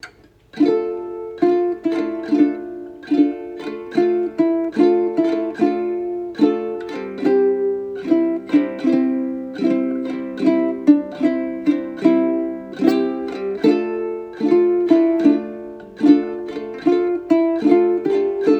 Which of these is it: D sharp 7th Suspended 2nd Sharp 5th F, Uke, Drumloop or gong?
Uke